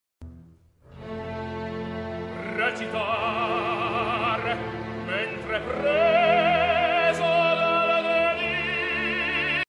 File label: Opera sound